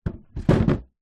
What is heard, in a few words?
household cool box plastic set down ground; zapsplat